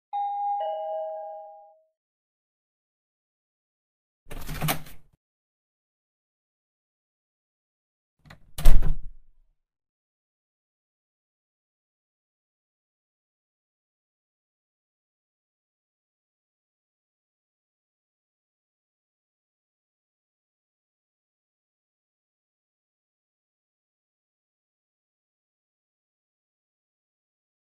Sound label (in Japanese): 耳舐め オナサポ （SEのみ